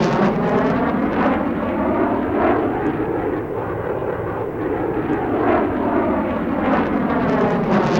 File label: jet heavy; space kitty